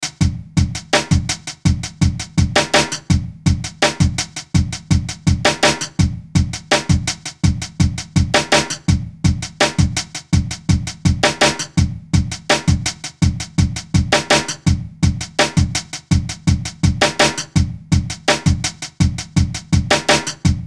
BEAT ELECTRONI, Beat Loop Electronic Rap Hip Hop Relaxed Groove; Miscellaneous Loops; Blastwave